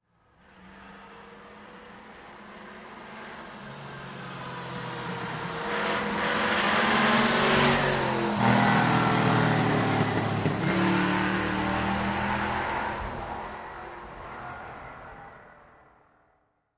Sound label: Car, By Fast, Cu.